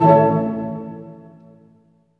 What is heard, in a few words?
HIT, Tutti Hits, Dynamic Orch; String Master Series